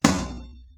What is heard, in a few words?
Low Timbale